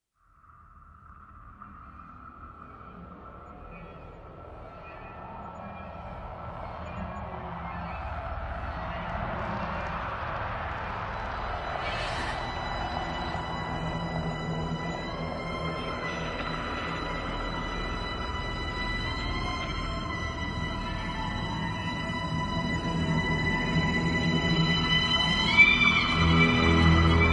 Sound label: Torment Ambient